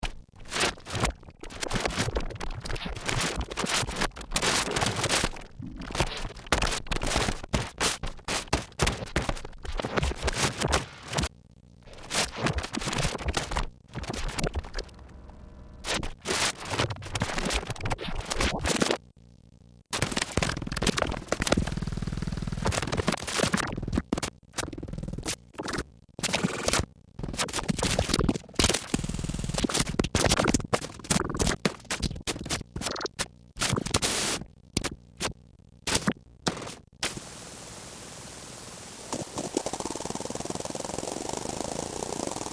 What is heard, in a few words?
synth crash